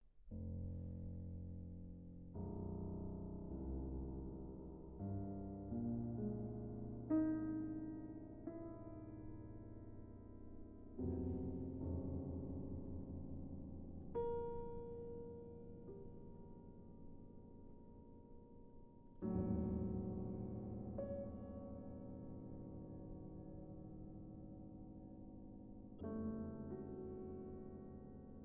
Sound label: pour piano Lointain Calme; David Fray; 12 Notations